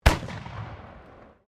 SHOTGUN BLACK POWDER CAPLOCK FIRING, Black Powder firearms at a shooting range; Guns